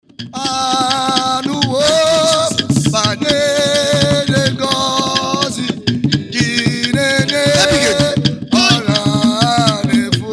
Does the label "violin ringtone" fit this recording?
No